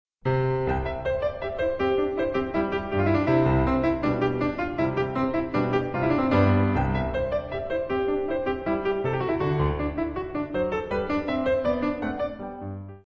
Sonatine, piano; Allegro moderato; ProPiano

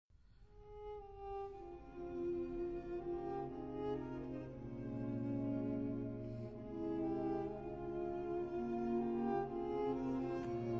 Quatuor Fa; Quatuor en fa majeur, quatuor à cordes, composition : 1943 ?; 4. Très vite (à un temps)

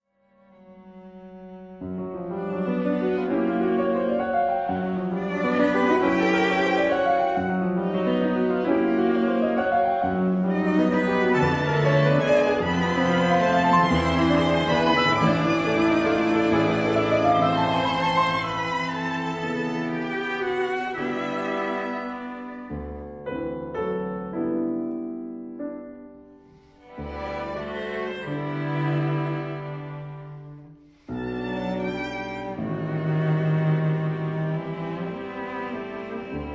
Quatuor piano; 1. Allegretto moderato; Paris : Heugel, 1946

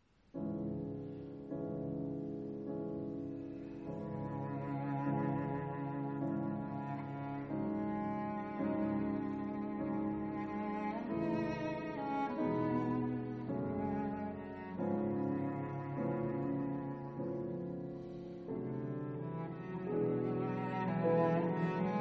Quintette

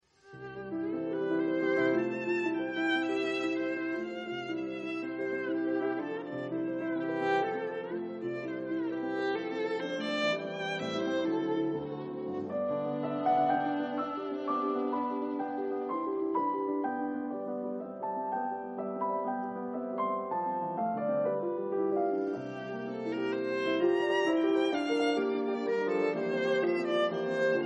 Sonate violon piano, Sonate, violon et piano; 1. Sans lenteur, tendrement; Paris : Heugel, 1927

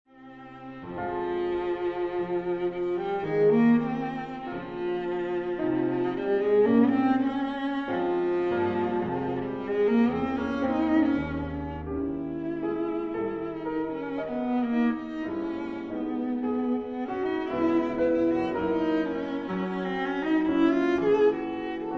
alto piano

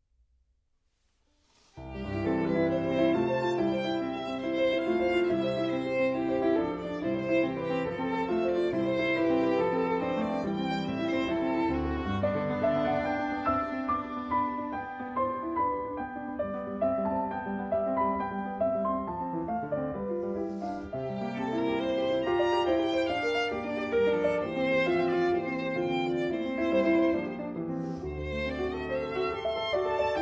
Sonate violon piano

